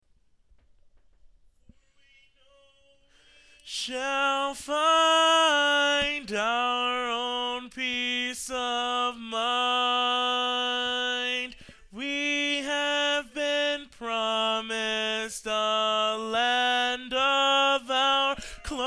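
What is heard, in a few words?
Baritone, barbershop tags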